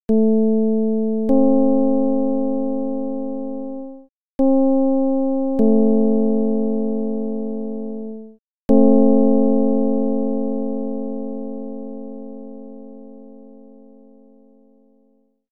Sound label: csound foscil 220hz